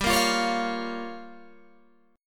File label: G Minor 7th Flat 5th, Guitar, Open G